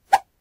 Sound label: woosh; Kenney's Sound Pack; Foley Sounds